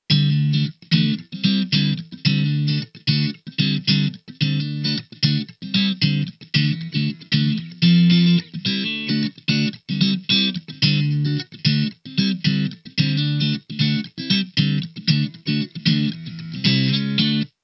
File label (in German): Rhythmus ohne Kompressor